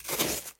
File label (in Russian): korotkij chyotkij zvuk sovkovoj lopati votknutoj v pesok